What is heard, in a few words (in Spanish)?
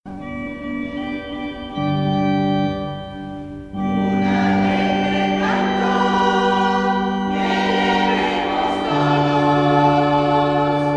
Un alegre canto